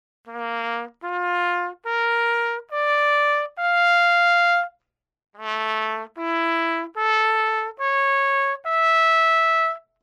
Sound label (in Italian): Armonici Con Tasti